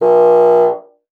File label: MULTI, bassoon; Xsample VOL-6